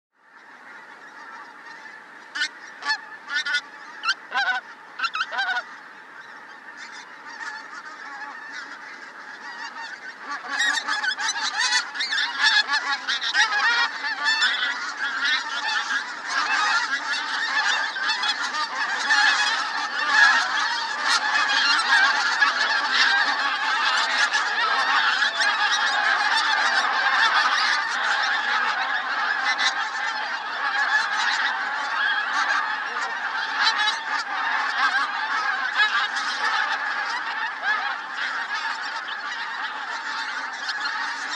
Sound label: Pink-footed Goose